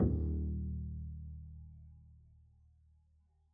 pizz eb1, double bass; dsmolken

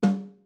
snare no snare mf, Struck Membranophones; Legacy Snares

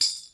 tamb, Tambourine

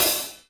Hat Semi Open Soft; hydrogen drum samples

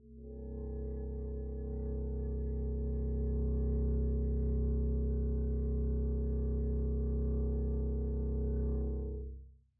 arco c1 pp down, double bass; dsmolken

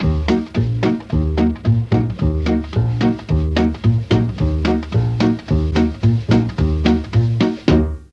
B1 foxtrot sax, mellotron

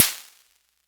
univox sd, drum machines; Micro Rhythmer 12

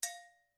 agogo Bell ff, Struck Idiophones; Agogo Bells

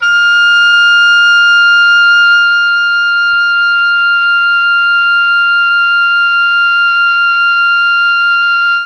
F4 oboe, mellotron; CHMBLN OBOE

